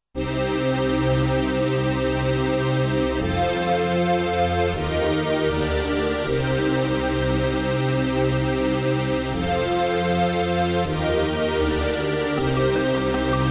Trance; Sonic the Hedgehog Arcade

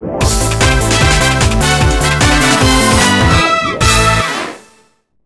Carnival Music; SEGA; Sonic Riders

